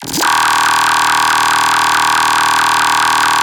Bass Loop D# 140BPM, Dubstep; Fairy Bass; Ghosthack - AC2024 - Day 13 - Dubstep Sounds 2025

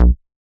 Squarehead Bass B

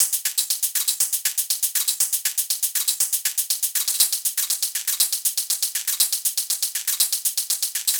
Perc Loop 120; Drum; Haze; Ghosthack; Drum Mega Bundle Demo; Drum Hero 5

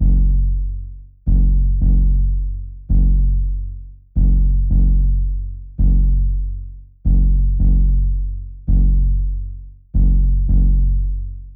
166 BPM F# Min Bass; Trap; Melodies; Loop Stems; Cymatics; Trap Starter Pack; Toronto

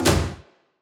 Stomp; Cymatics; 9God Hip Hop Sample Pack

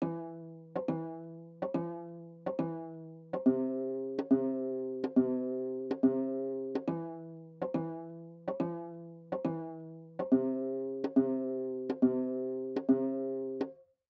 140 BPM E Min Bass; Loop Stems; Cymatics; 2022 Melody Collection Platinum Expansion - Pt 1; Cook Up

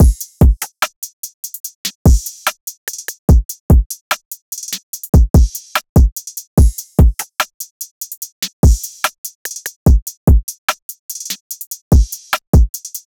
Drum Loop 146 BPM; Tighten; Cymatics; Mystery Pack Vol 4

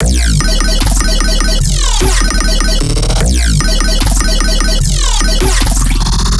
Bass Loop X Chaos, Dubstep, 150bpm; Ghosthack; Dubstep Sound Arsenal

